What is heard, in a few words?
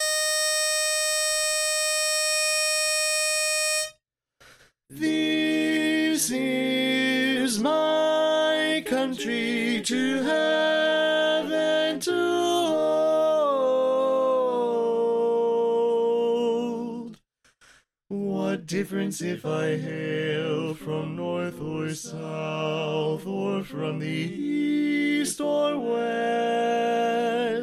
Lead Left